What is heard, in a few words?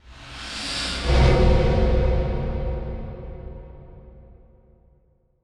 Transition Whoosh Medium; Production Element; Title Transition; AdobeCC